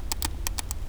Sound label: Pasta gnawing in rats